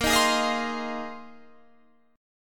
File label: Bb 7th Suspended 2nd Suspended 4th Bb, Guitar Standard; Bb7sus2sus4; strummed; 18,18,18,20,18,20; JGuitar